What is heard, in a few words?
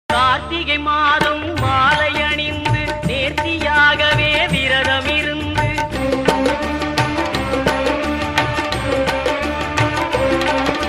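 songs background music; sabarimala ayyappan temple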